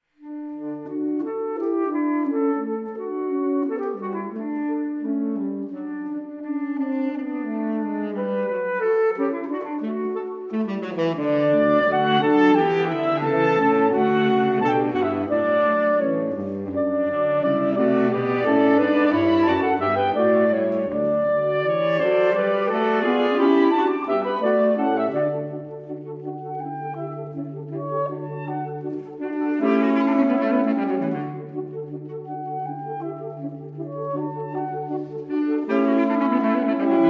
String Quartet in D Major (Arr. for Saxophone Quartet) IV. Allegretto